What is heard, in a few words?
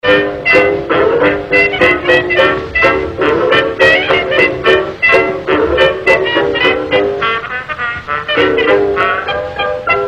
bigband